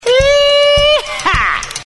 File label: Zvuk I ha